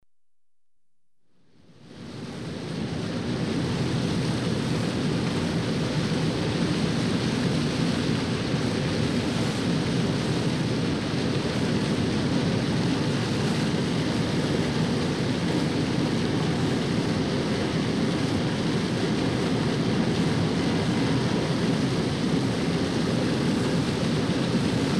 German U-Boat, Cruising on surface with diesel engines; The BBC Sound Effects Library